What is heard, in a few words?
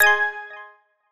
clock go